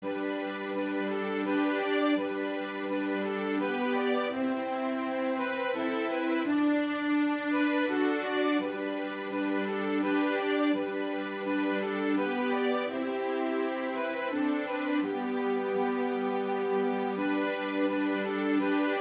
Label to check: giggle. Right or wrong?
Wrong